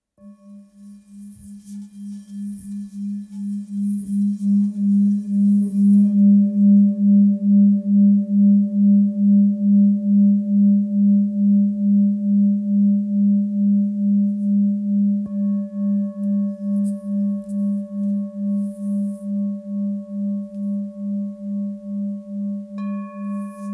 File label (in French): Bol thick sol diese